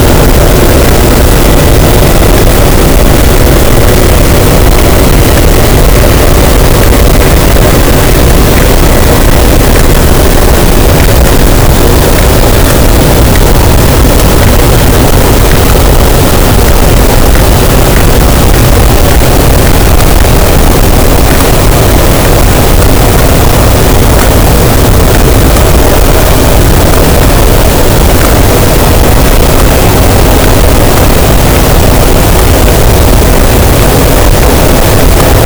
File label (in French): cachalot, Physeter Macrocephalus, gaussian noise